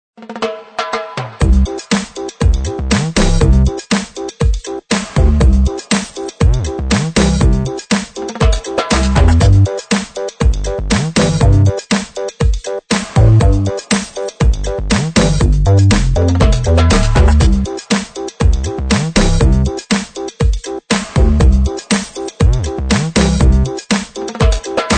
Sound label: Ragga Bounce Instrumental; 拉格反弹